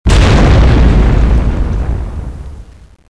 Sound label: explosion